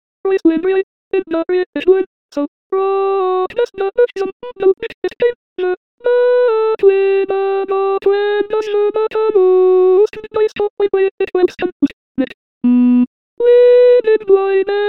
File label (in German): Zigeunerlieder alto